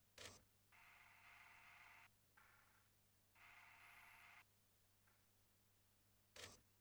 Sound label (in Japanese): ヘッドホンボリューム15 フィルタ発生; Gemini; TRONDO